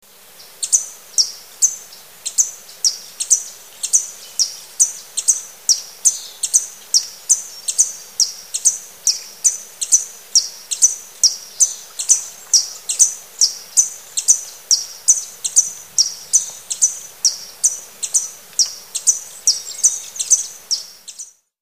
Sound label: Green Violet-ear